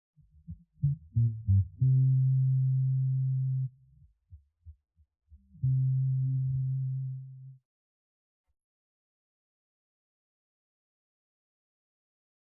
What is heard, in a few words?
bass